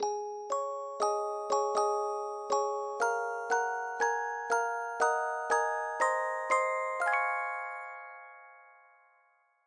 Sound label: meitetsu2000 chime